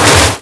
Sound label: CRASH, 碰撞声音素材